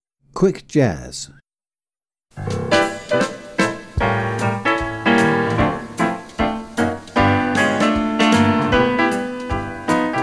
Quick Jazz, tap

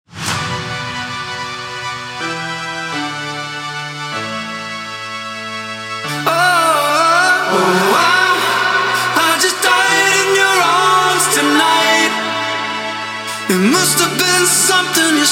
Jazz dance; intermediate foundation modern

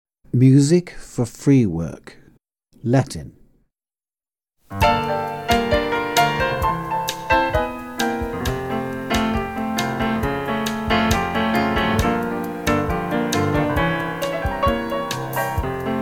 music for free work Latin; tap advanced 1

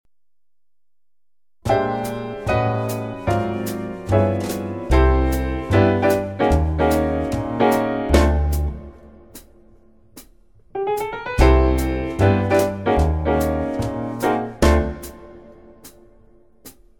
Timesteps Shuffles, tap; tap grade 5

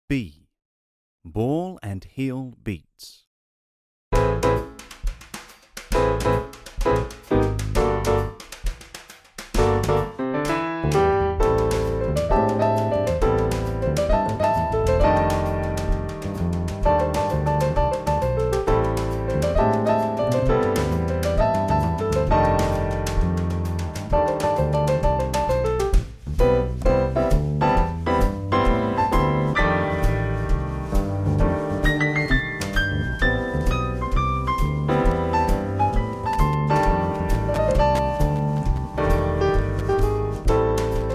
Ball and Heel Beats, tap

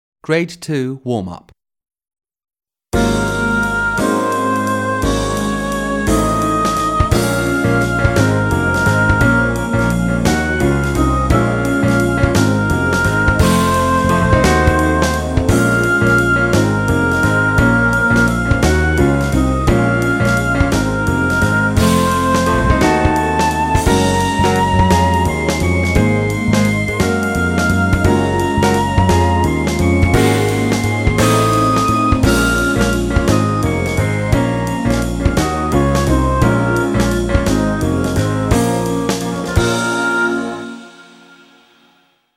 Grade 2 Warm up Modern; Modern Exam – Grade 2

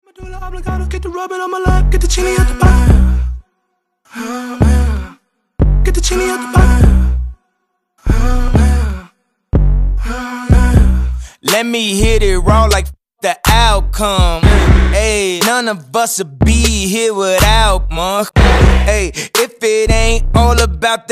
Hiphop; Dance 1; hip hop level 7

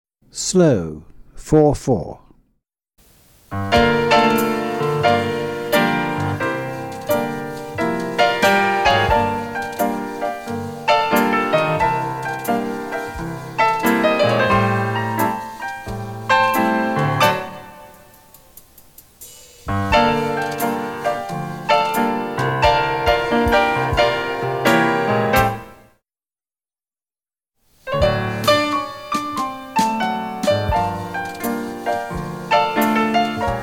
Slow 4 4; tap advanced 1

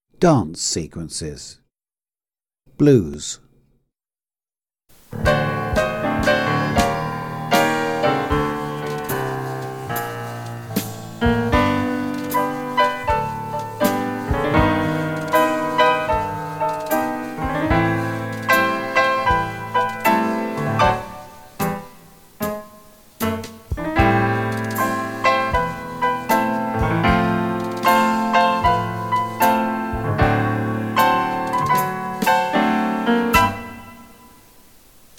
Dance sequence Blues, tap

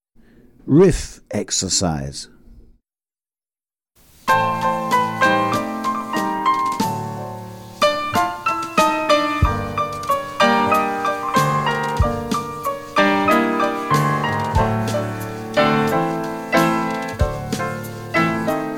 Riffs, tap

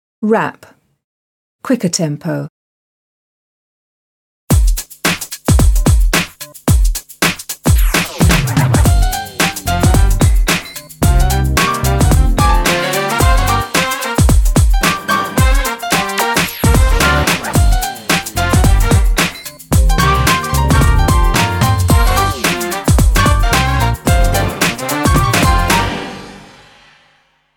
Rap quicker tempo

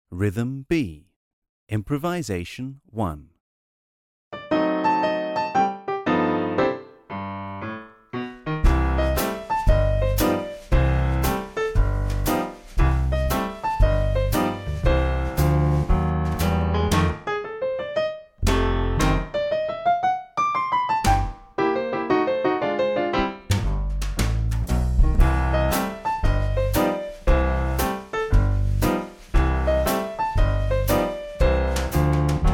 Rhythm B. Improvisation, tap

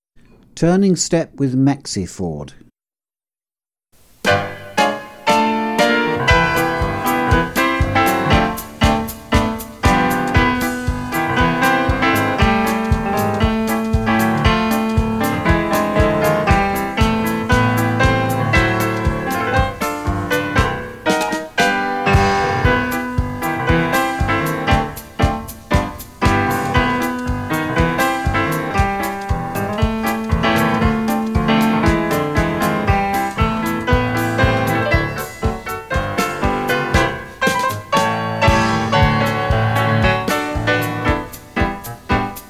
Turning step with maxi ford, tap

